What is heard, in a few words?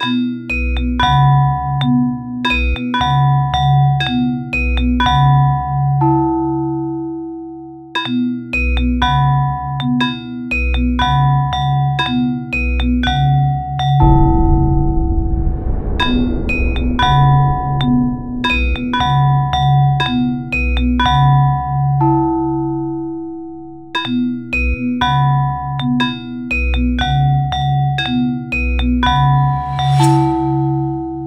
gam Boom Swish, Cycles; Future Music